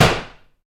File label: bullet impact wood